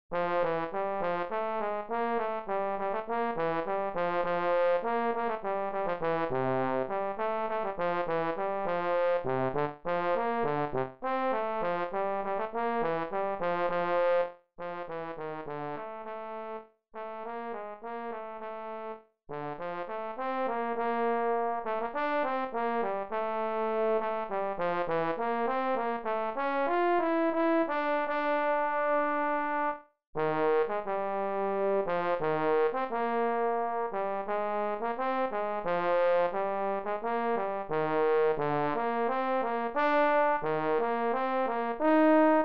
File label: trombone